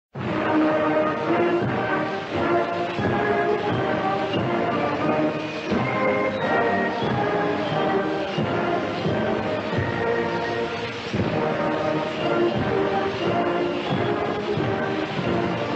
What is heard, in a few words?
Deutschlandlied (old recording)